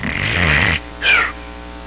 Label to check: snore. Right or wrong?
Right